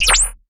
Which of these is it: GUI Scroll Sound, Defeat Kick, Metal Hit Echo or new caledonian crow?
GUI Scroll Sound